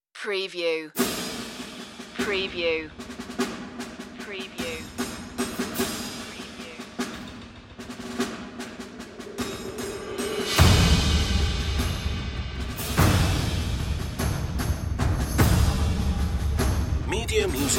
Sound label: percussion only version